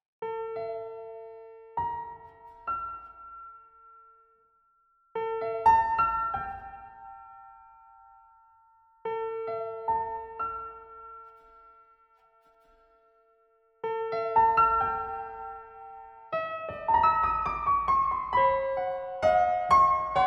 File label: Piano